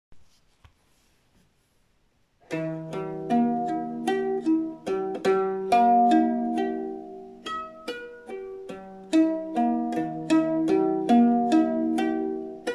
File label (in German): harfe solo gezupft; musikarchaeologie